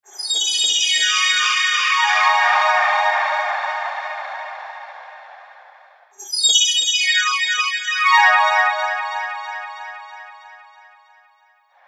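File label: Synth Arpeggio Elevator Delay; Eventide; MicroPitch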